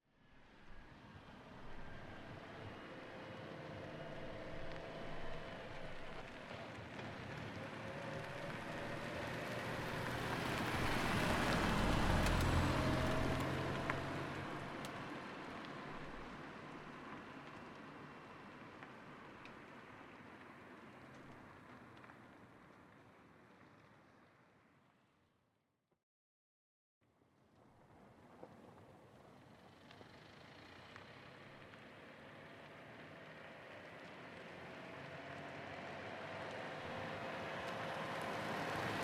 Car Pass-By Car Pass-By Car Pass-By Slow Speed, Car Foley Seat Ibiza; Seat Ibiza 2019; Systematic Sound; Mini Themes